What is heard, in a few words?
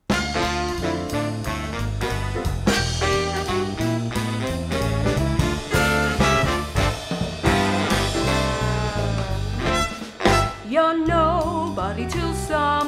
vocal